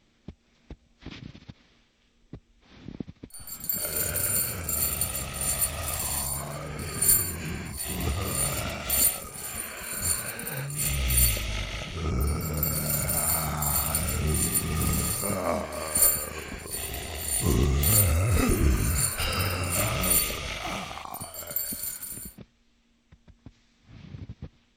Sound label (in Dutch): zombie rustig met ketting